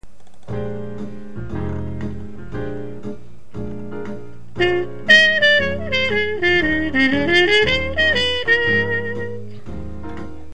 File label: lick